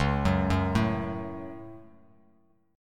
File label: C sharp Suspended 4th Sharp 5th C sharp down Bass Standard; arpeggiated; JGuitar